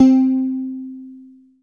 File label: HARP C4; Greytsounds; Scott Peers Sound Engineering